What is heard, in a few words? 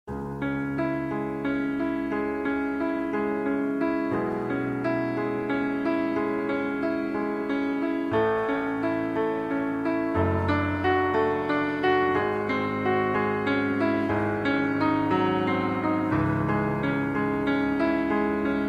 moonlight